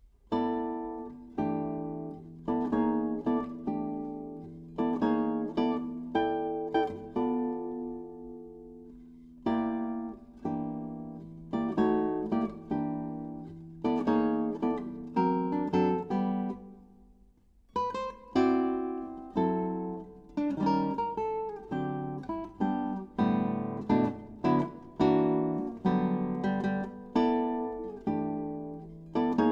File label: Blues for Classic Guitar